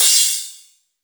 CYM, 160 BPM; Ueberschall; Drum & Bass Flash Trackz

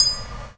PIPET; 3D Multis; 3D Sprint Select 1

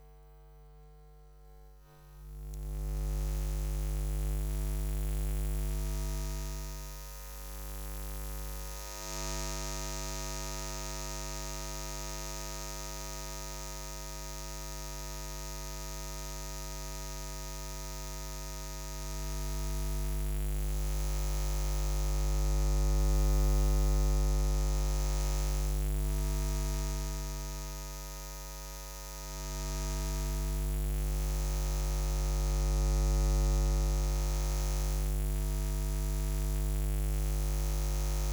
Electromagnetic Fields, Hummin; electric fields

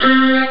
violin; Amiga Soundtracker Sample Packs

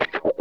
wah snip, Funk Gtr & Wah; PlatinumPhattCD2; E-MU Producer Series Vol. 8 – Platinum Phatt

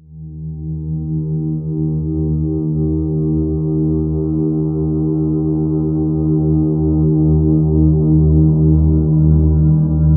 ALIEN, Ominous Drones; USB Soundscan vol.48; AKAI; LOWTONES

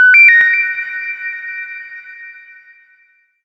SHORT, SHORTNOIS

